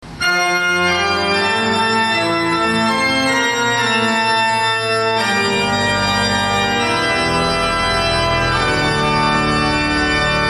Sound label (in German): Improvisation, orgel; ternitz 2008